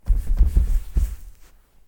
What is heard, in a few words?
sit on Tatami